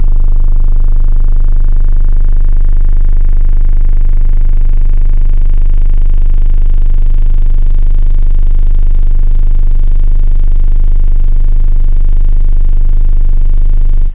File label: sub base, bass, synth; da capo vol1